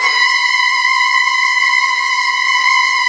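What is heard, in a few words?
violin trills; ILIO; Kirk Hunter Virtuoso Strings VOL-1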